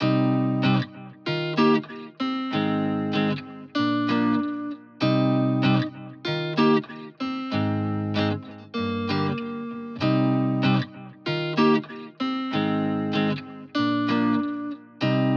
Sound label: 96 BPM C Min Main Guitar; Cymatics; Miami Guitar Pack - Pt 2; Rum